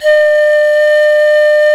pan D 5, panflute; SoundBytes - Early Patches

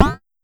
Pollard Perc, Syndrum

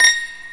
YANG-CH'IN; AKAI S6000 CD-ROM - Volume 1

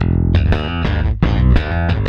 F#, Bass; Spectrasonics; Bass Legends; 128 PWRSTRUM

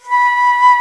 flute B3, ebony flute; InVision Interactive; Lightware VOL-1; Instruments & Percussions

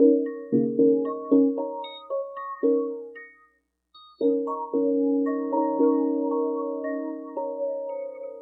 Mute Bells 114 D#; Percussion Elements

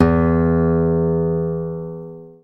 Nylon Guitar

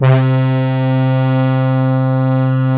ARP, Analog Fantasies

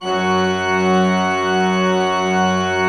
Cathedral Organ; Propeller Island

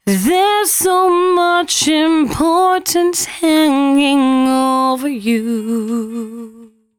113bpm C#, Velvet Vocal; Zero-G

